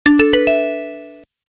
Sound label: melody